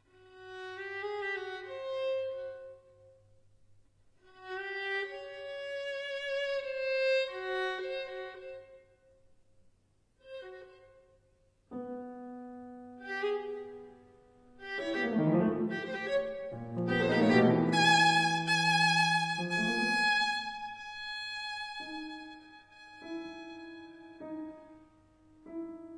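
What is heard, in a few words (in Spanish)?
partita violín y piano; Witold Lutosławski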